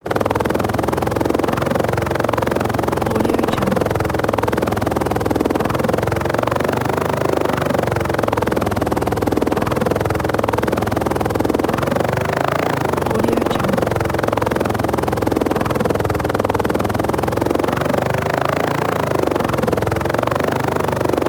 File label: blades helicopter const sharp rapid chops; game; هلیکوپتر